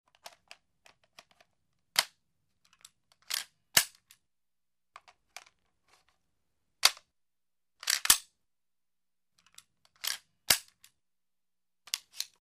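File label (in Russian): перезарядка автомата узи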